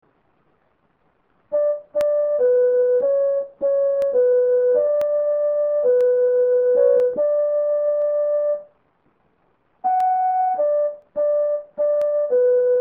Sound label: Chor Lyr Flute; GreekSounds